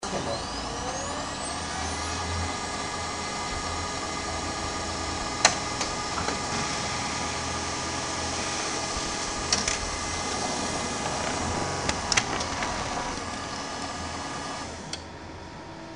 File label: printing